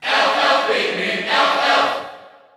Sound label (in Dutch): Cheer Dutch; Alph; SSBU